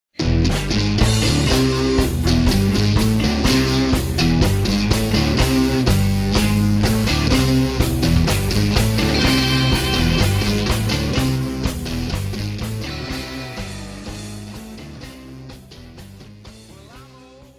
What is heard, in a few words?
Ska